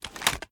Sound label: reload empty raise; reloads; gmod